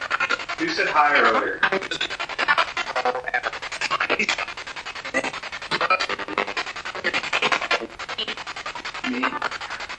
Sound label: Spirit Box; 2nd Floor Hallway